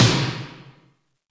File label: Snare, Alesis D4fx; Drums